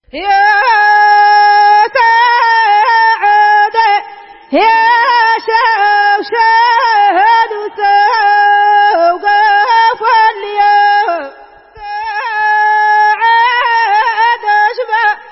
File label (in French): Chants Femme Fernana